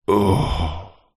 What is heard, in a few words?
human male middle aged groan hungover ill; zapsplat